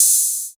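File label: TR-808 open hi hat; Hi Hats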